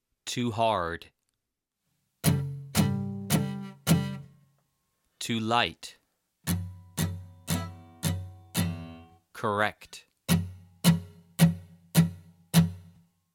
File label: Muted Strumming Drill